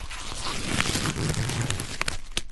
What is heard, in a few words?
swinging rope